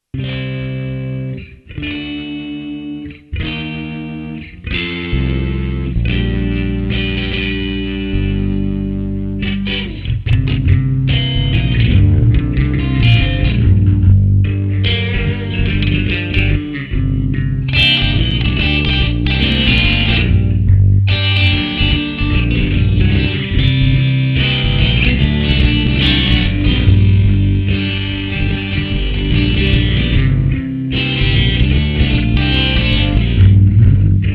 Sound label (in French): guitare cow-boy